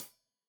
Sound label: Hat Tight, Hi-Hat, Cymbals; Deeply Sampled Drums